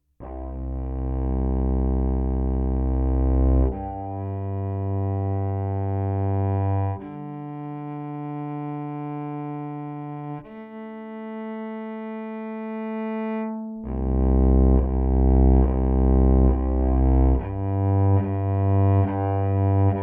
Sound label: pickup direct